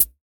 raw high hat g key, Royality free pedal hi-hat tuned to the G note. Loudest frequency: 11878Hz; drum single hits one shots